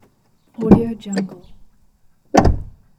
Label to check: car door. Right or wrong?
Right